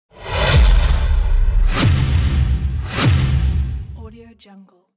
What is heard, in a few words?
whoosh transition; alexandersizonenko